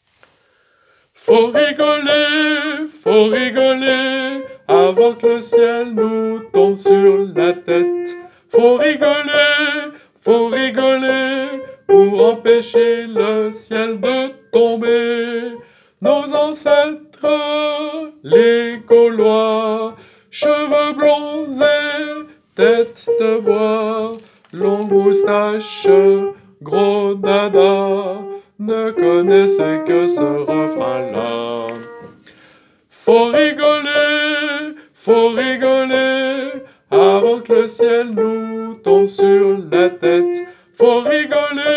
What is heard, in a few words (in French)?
soprano